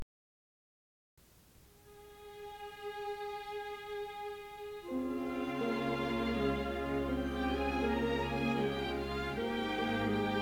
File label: Fantasy for Orchestra